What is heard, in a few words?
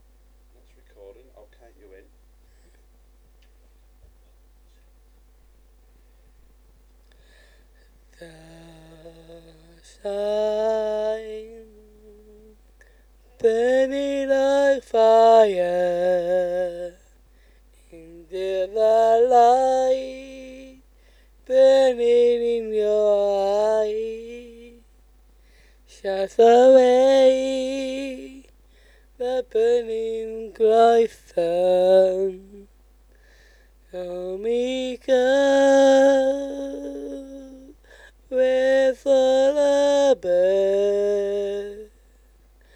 Bird Song Vox